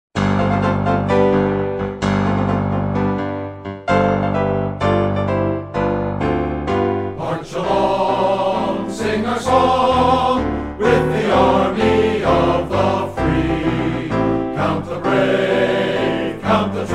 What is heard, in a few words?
Army Song Short; Rehearsal Aids